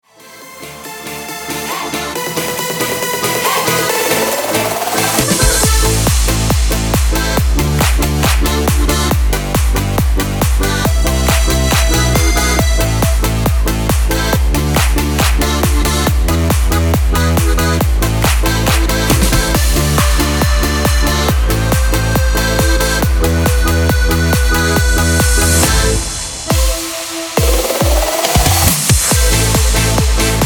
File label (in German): karaoke ohne Chor